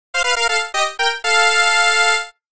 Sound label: lovelup sound